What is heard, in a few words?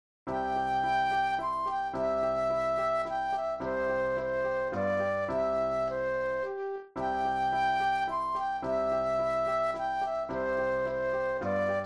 Minuet Flute Solo; classical